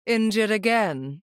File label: injured again; Moira